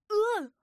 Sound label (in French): Herisson Aie; mirumiru